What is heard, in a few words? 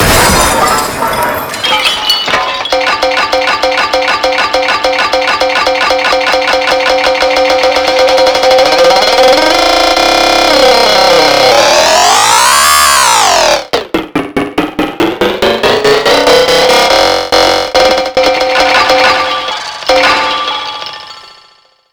Glass Smash Riser; reloaded samples